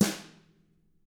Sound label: TAMA BR F, Drums; ILIO; Double Platinum Drums 1